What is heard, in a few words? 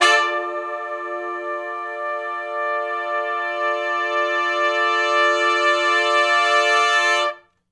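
2 measure Long Note with Swell (D) harmonic minor, horn section, R&B; Sonic Foundry (Sony Creative Software); Sections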